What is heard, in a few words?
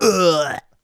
Player Death; liblast